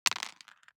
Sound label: DICE on Cardboard, Throw and Roll, Mini, 3 Three Dice; Samples; ArticulatedSounds; DICE Sfx Party (Extended)